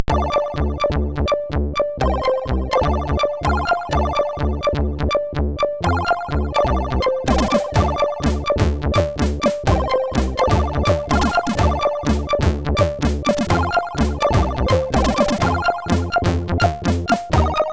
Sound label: Intro Tune, SID tunes